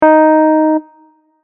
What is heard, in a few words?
D1 sharp, Keyboard notes